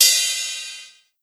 long ride cymbal drum sample D key, Royality free ride tuned to the D note. Loudest frequency: 6127Hz